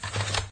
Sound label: hwacha reload; Minecraft; Votive 1.21.1; Votive Content